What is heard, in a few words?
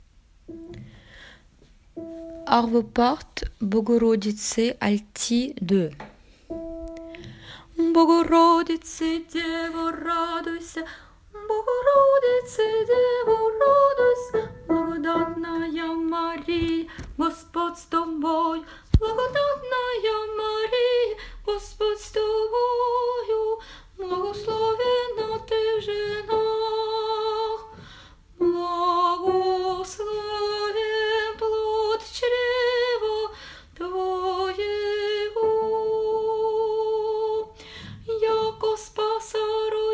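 bogorodice altos; arvo part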